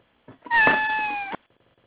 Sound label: cat meow